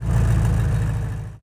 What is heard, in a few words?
engines; World of Tanks